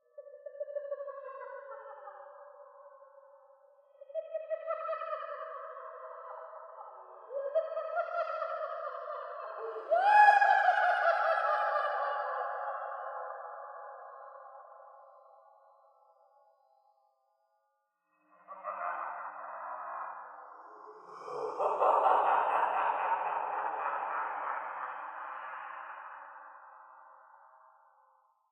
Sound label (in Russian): smeh prividenija